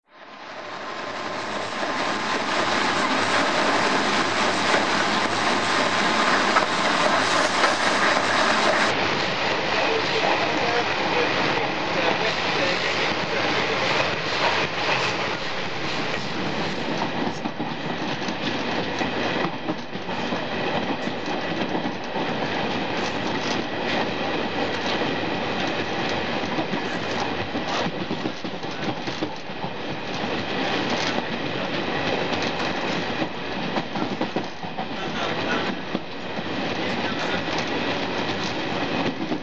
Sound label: Train Recording Somewhere Between Varanasi And Gorakhpur; Field Recordings From India Nepal; Jani Hirvonen